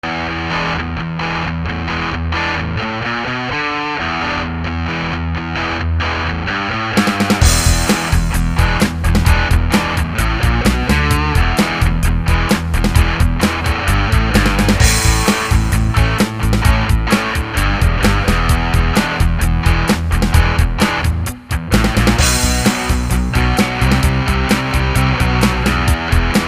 tele rear

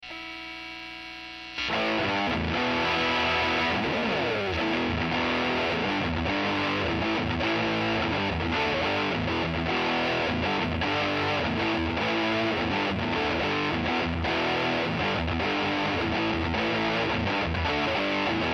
heavy

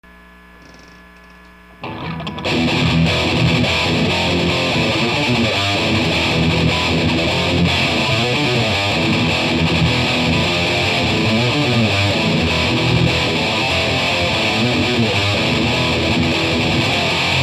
flying v

